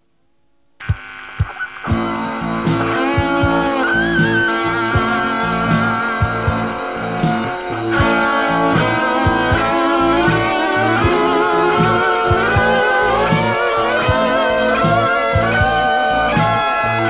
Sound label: blues